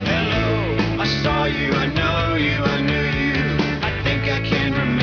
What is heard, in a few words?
pop song